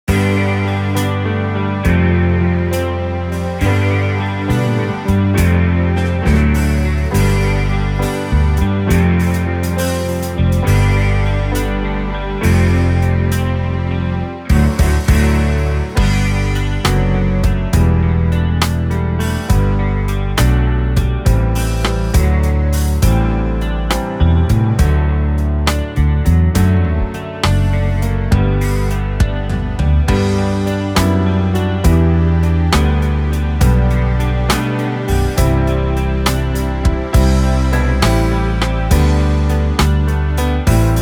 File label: Instrumental; Steps to Christ Activity book CD